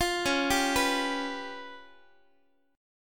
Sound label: C sharp 7th Flat 5th Guitar, chord; C#7b5; arpeggiated; tuning=4A,4E,5A,5D,5G#,6C#